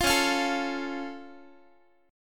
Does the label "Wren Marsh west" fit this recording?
No